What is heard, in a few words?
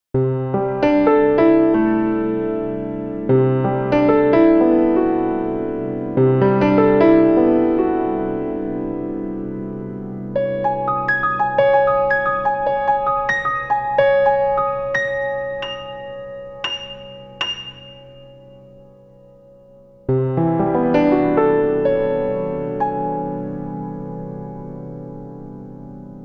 Fifths improv